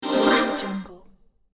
futuristic cosmic ambient video game browse menu sound